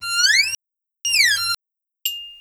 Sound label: Element Cicada